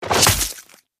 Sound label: headshot; division